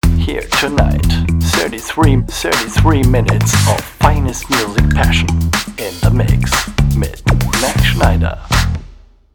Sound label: Jingle